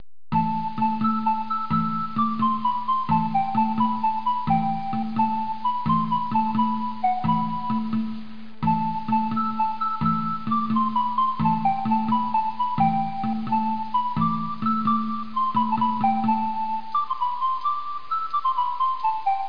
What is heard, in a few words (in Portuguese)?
trilha